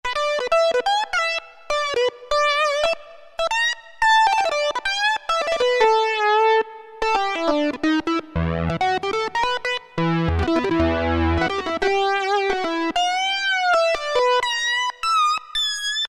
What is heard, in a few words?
Lead Synth, Roland MKS-50; Factory examples